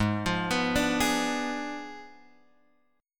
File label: G sharp Diminished 7th G sharp; G#dim7 Chord {4 X 3 4 3 4} arpeggiated